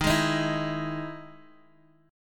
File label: E Major 7th Eb